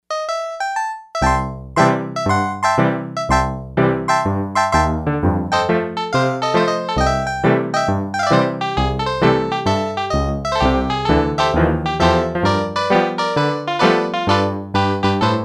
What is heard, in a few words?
HonkeyTonk, Yamaha DX100; Factory Patches